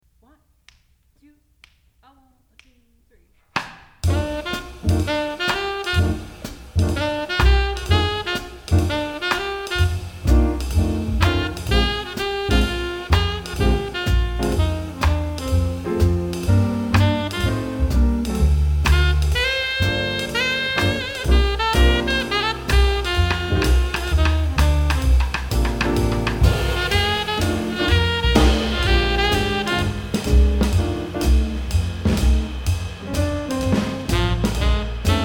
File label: Blues drums; All-State Jazz etude